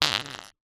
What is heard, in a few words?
cartoon fart